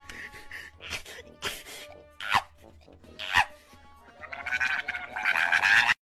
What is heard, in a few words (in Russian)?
hork vzvizgivaet ot negodovaniya; zvuk horka